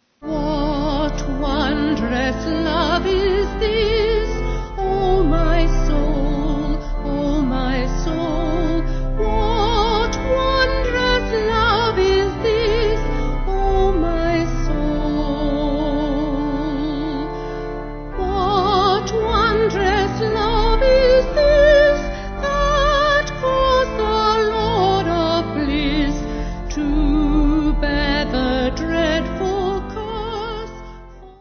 Vocal Organ

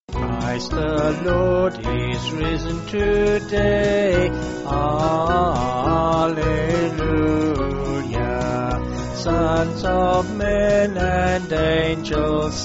Christ The Lord Is Risen Today Easter Hymn

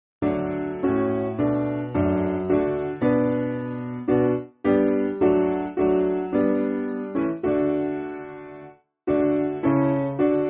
Piano; O God The Rock; Jubilate

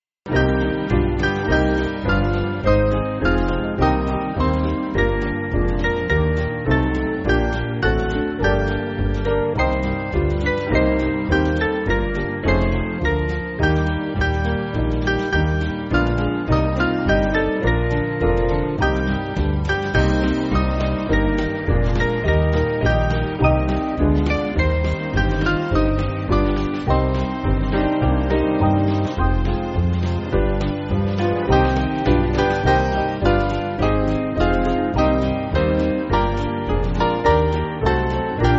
Band, Backing Files for Churches

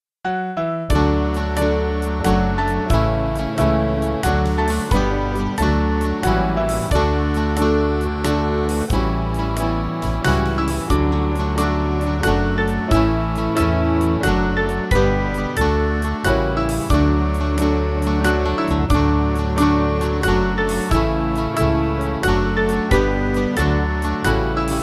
Band; Come Thou Fount; Nettleton

